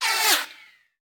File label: Bullet Pass By; Sound Ideas; Guns